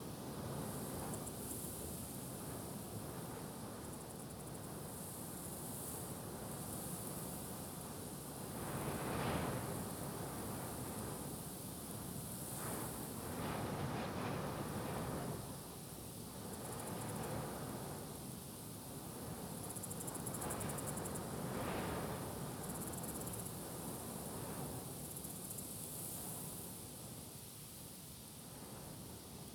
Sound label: ambience wind desert insects; Rust